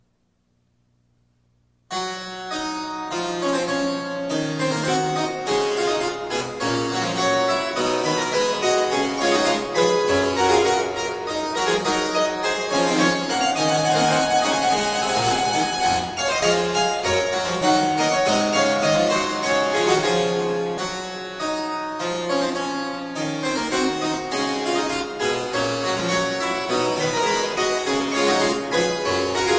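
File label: Variatio 22 a 1 Clav alla breve, Harpsichord; Bach Goldberg Variations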